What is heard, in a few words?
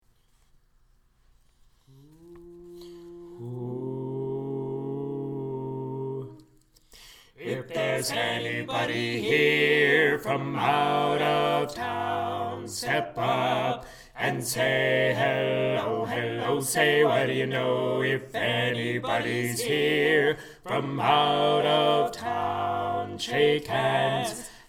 Any Bass, Chorus